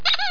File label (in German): ENTE